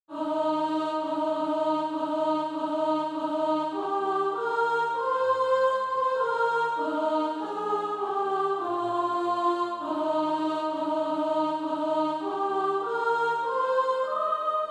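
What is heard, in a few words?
soprano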